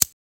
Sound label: button, App FX; 3maze